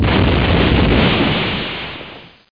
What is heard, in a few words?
BOMB; AIRPLANE